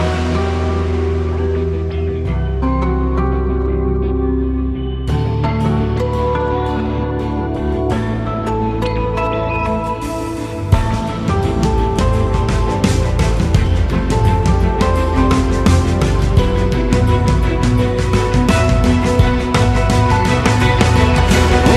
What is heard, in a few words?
Ringtone For Mobile